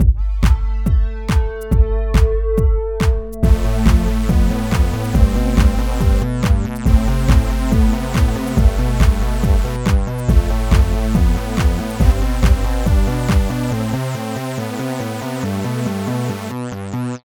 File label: Cyber Intro